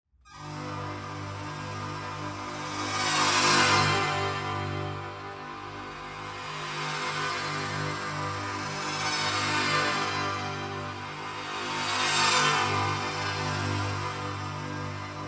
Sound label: Ambiboid Grainlet Periphonic